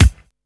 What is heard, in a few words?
Bassdrums